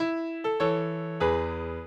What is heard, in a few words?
minuet, mozart